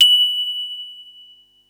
mallets

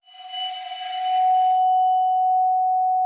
ARCO F#5, VIBES; Xsample VOL-4

